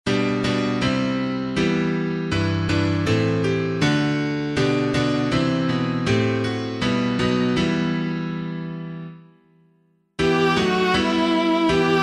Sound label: piano